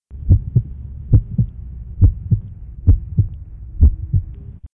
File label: heart beat; Predator Mod